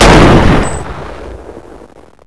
RIFLE

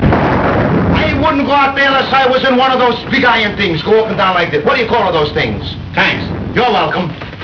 tanks

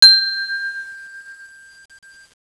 chime

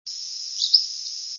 phoebe redeep; birdsongs

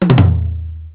toms

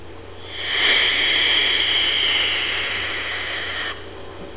OWL; ghost; rehmeyer

